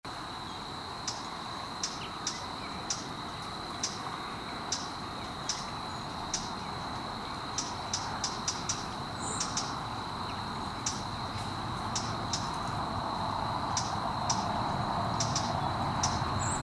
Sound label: Sylvia atricapilla; SETE